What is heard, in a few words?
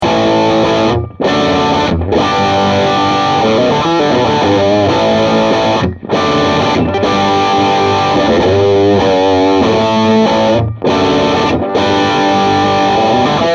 Distortion SM57 off axis; Bogner